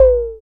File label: FX Analog; Sound & Vision - Gigapack II